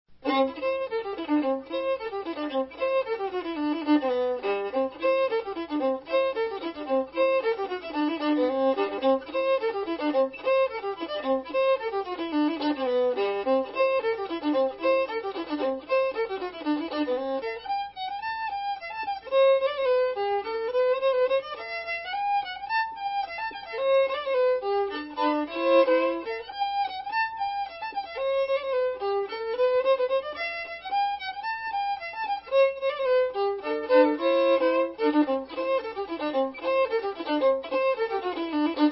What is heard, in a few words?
Postuns Jig